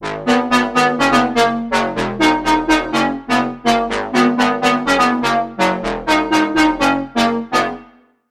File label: Lead; Routed Sound; Sylenth1 Expansion - Noble Gangster Vol.1